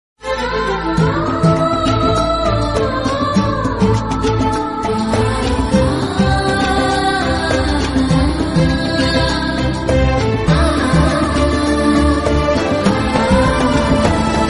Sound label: music bgm love ringtone love theme ringtone; magadheera